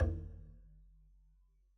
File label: pizz noise low thump, double bass